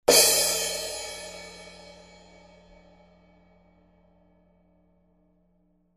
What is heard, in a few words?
15in Fast Crash